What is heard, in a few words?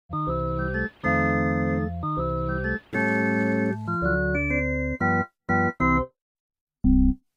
keyboard cue; the wiggles tv series 2 soundtrack